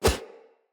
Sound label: Split Sword Whoosh Fast; Weapons; Player; Swords; Starter